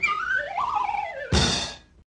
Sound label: warbly whistle rise fall and crash